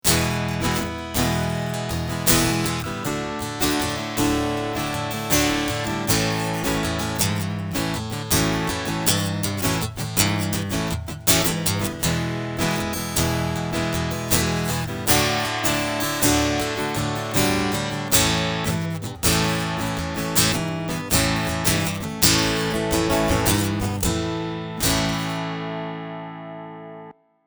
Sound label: Strumming Preamp